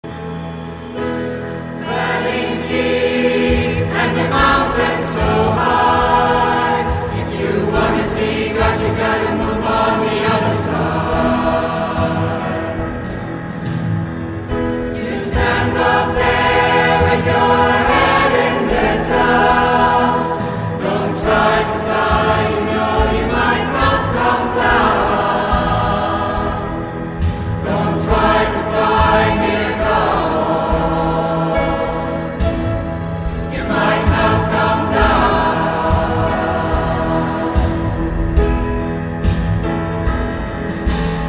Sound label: Hymn